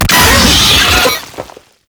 Pain, Vocals; Warframe; Proto Infested; Brachiolyst

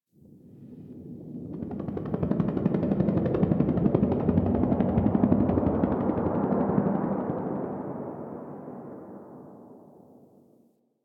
Distant Ambient Helicopter; Warframe; Ambience; Nineteen Ninety Nine

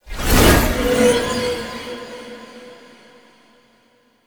Horse Disappear; Vehicles; Foley Actions; Warframe; Duviri

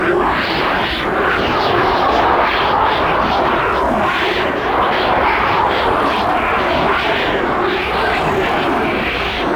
Sentient Search Drone Tether Loop; Warframe; Tau

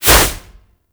Fist Whoosh; Warframe; Mummy

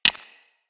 Console Hack Button Press

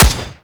Gunblade Automatic Shot; Tenno

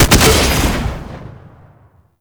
Exalted Sniper Alt Fire Shot; Warframe; Frumentarius

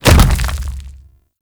Golem Step Default; Warframe; Footsteps

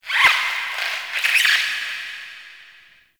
Critter Squeak Alt; Ambience; Warframe; Nokko Colony

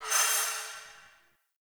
Health Regain; Characters; Heal Stingers; Envoy

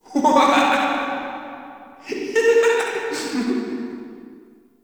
Dungeon Island Recorded Children Misc Amb; Ambience; Warframe; Duviri

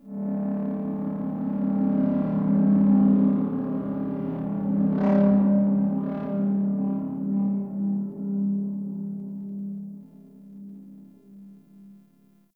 Gritty Pulsing Drone; Warframe; Ambience; Nineteen Ninety Nine

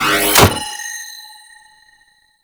Trap Open, Weapons; Warframe; Cephalon; Librarian

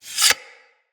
Dagger Sheath; Weapons; Dual Daggers

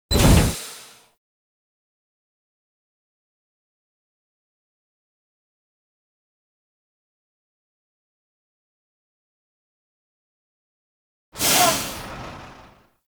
gear stinger; Warframe; NineteenNinetyNine; Stage8ShitboxConvoy

